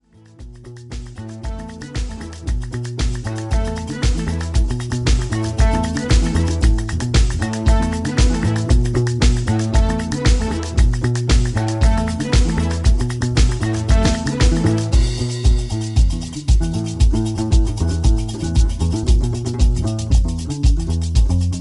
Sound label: Instrumental